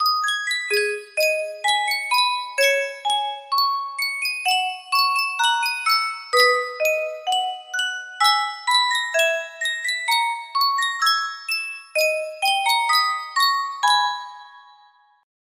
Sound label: Yunsheng custom tune music box